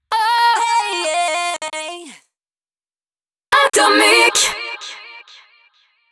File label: JINGLE acap; ATOMIC; HABILLAGE ACAP